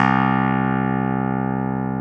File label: KEY X Hohner Clavinet